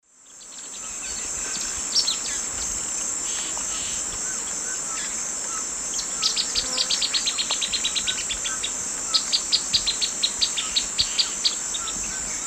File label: Serpophaga hypoleuca, songs 2 birds; S. America; Eastern Lowlands